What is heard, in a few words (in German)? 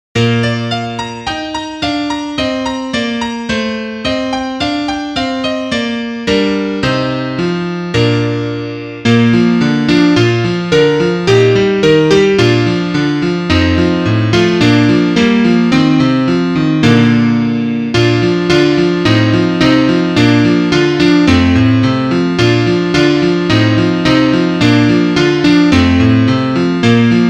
Klavier; alle voegel sind schon da